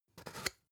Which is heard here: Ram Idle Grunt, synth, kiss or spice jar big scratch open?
spice jar big scratch open